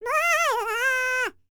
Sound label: BLACK SEA, World Voices; ILIO; Vocal Planet VOL-5